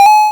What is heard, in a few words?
leisure video game retro 8bit coin pickup collect